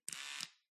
glitched tones household bathroom grooming electric razor on off buzz